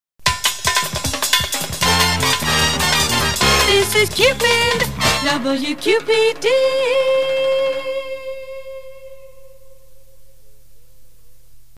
jingle This is Cupid; WQPD

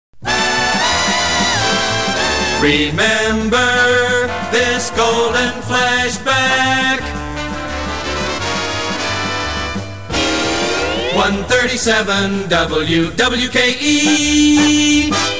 jingle Golden Flashback; WWKE

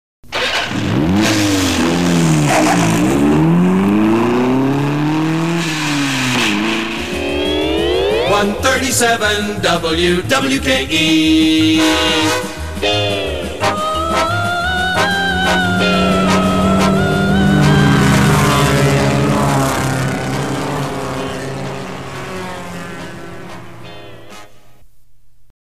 jingle hot rod; WWKE

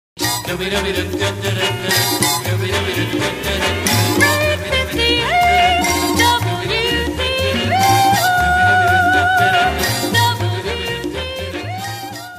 jingle; WDBO; Great 58 WDBO

